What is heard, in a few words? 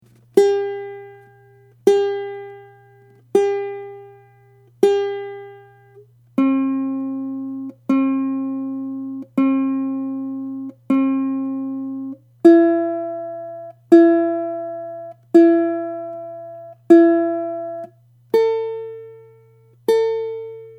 tuning, ukulele